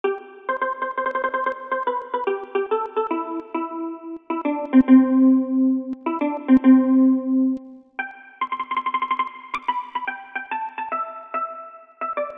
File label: Mandolin